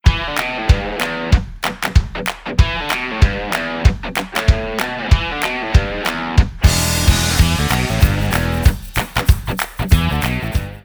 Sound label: Rock